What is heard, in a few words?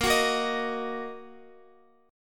Bb Suspended 4th Sharp 5th Bb down Guitar; strummed; tuning 4E,4A,5D,5G,5C,6F